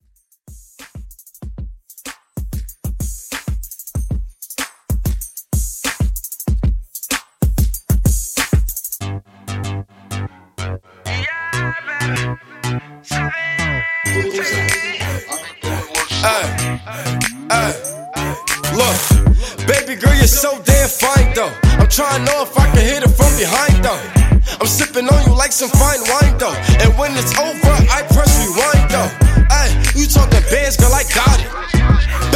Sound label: Hip Hop Redrum; Remixfreakz